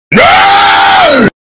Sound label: raaaaaah